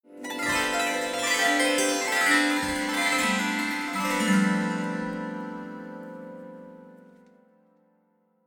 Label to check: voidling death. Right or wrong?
Wrong